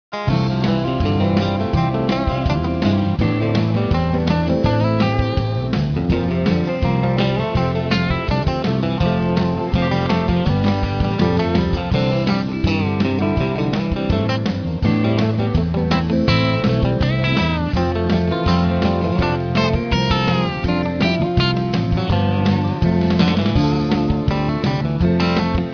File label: On Hold Jazz